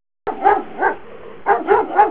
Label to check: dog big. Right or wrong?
Right